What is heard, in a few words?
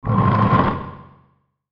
SWELL SCRAPE, Swell Scrape Dark